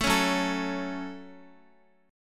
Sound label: Gb Minor Guitar 4E,4A,5D,5G,5C,6F; strummed; JGuitar